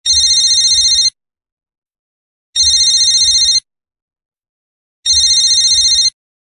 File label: Ringing